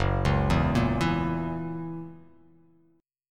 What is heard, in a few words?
Gb 7th Gb down, chord; arpeggiated; JGuitar